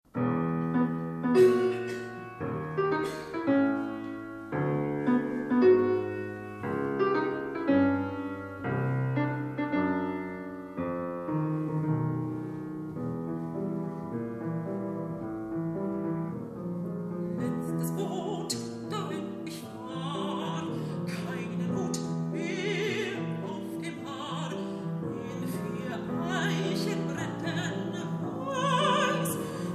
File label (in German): Dorfmusik